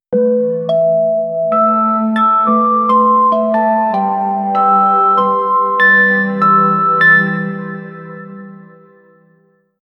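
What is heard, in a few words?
melodious but tense, film score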